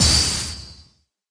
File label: Ice bullet